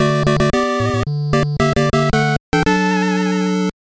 Chance Machine Jingle; Kaettekita Mario Bros.; NES

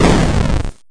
Explosion

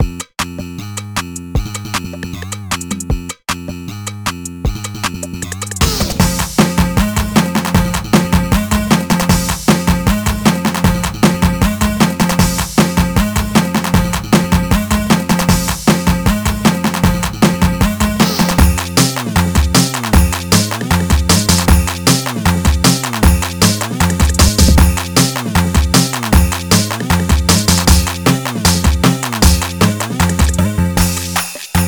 Instrumental

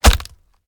Bone Collision; Angry Birds

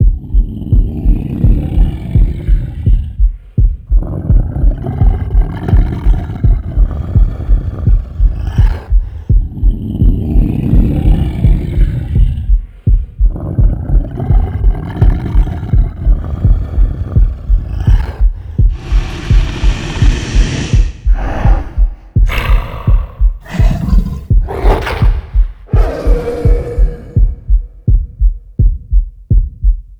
Demon Sounds; Lucius III

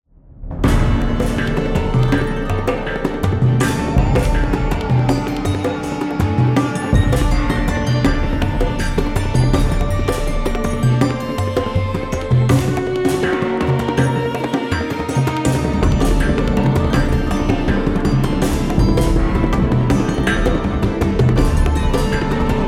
Loop Fight; Game of Thrones A Telltale Games Series

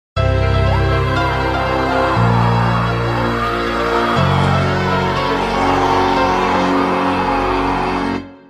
Mission Failed

